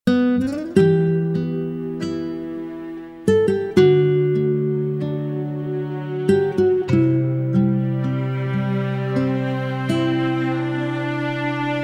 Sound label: Total Guitar Bundle; Ilya Efimov Production